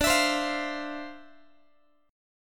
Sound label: D-Minor 9th, D, Guitar, 4A, 4E, 5A, 5D, 5G sharp, 6C sharp; strummed; x,22,19,22,21,x